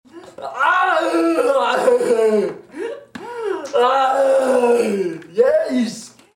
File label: freeze gurgling